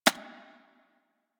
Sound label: Step; Warframe; Sentients; Brachiolyst